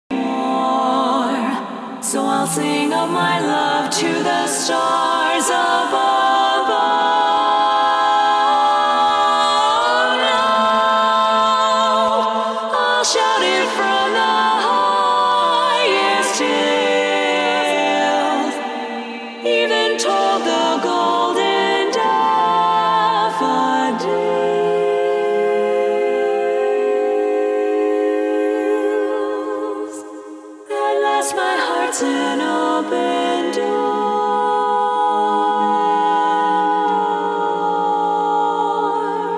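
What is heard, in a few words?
Bass mute from bar 52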